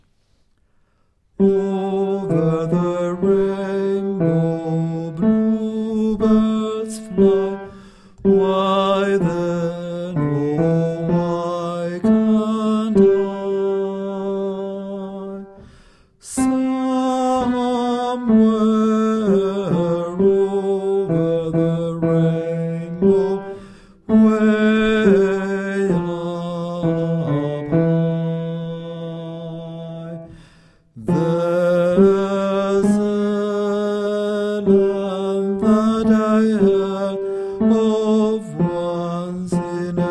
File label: bass